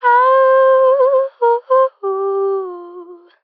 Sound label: Ad Lib Dry - C Min, vocals; Cymatics - Eternity Sample Pack; Ad Libs